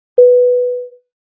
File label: time signal